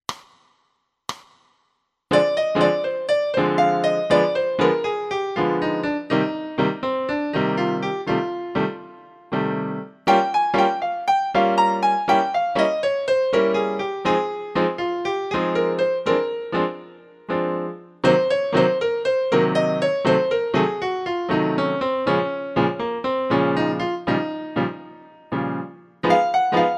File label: piano